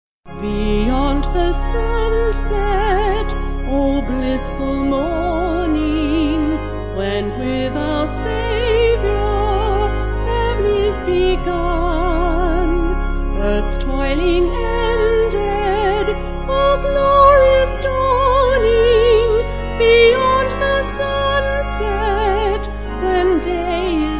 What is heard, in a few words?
Vocal Organ